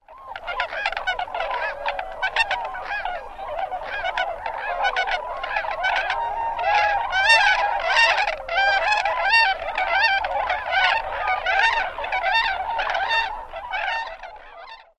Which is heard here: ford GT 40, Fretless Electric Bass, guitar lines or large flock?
large flock